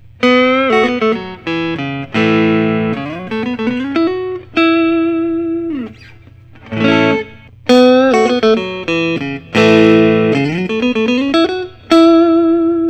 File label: fender custom shop